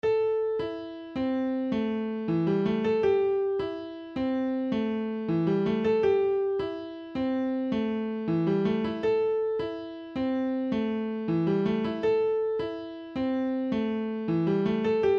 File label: Piano Test